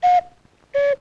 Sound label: Cuckoo, Vintage Cartoon Foley